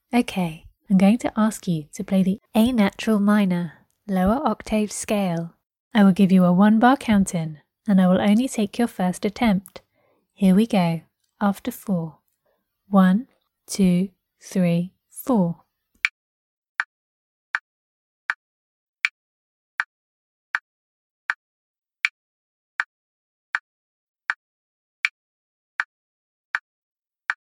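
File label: a natural minor lower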